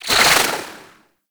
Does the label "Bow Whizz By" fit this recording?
Yes